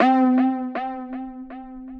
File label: Pad; Synth Pad; Pathos Pad Solva